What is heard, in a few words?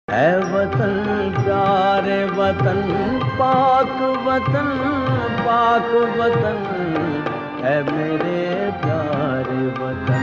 pakistani ringtone